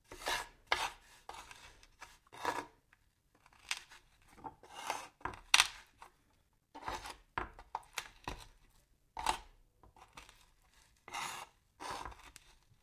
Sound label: cutting